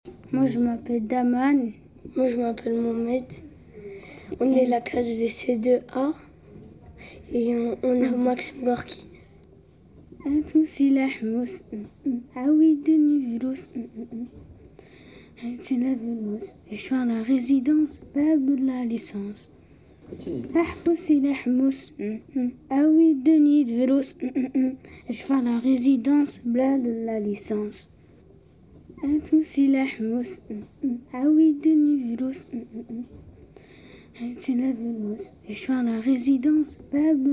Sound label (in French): duo en solo